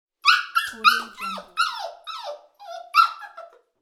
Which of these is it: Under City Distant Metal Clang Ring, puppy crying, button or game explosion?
puppy crying